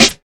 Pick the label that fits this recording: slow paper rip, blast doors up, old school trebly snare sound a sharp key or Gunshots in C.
old school trebly snare sound a sharp key